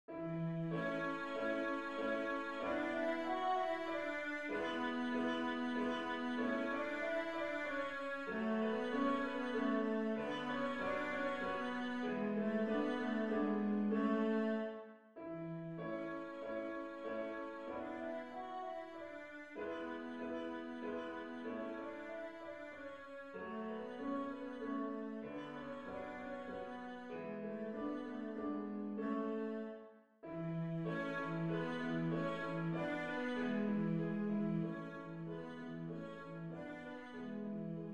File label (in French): contredanse majeur; beethoven ludwig van